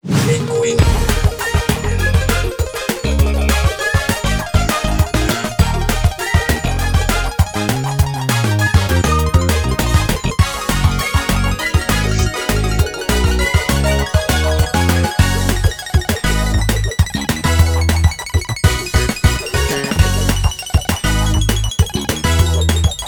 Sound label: bigwin; netent